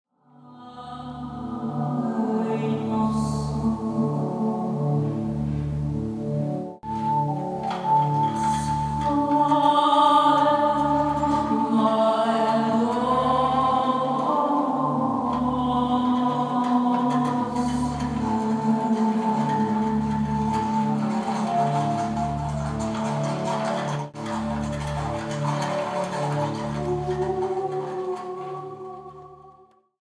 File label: Improvistation